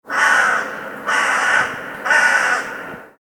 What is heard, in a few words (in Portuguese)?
corvos caw-caw-caw efeito sonoro